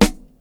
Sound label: 2000s clean steel snare drum sample c sharp key; Mono